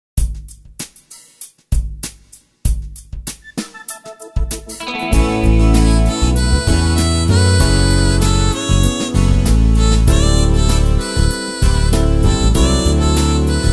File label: easy groove; KOR PA80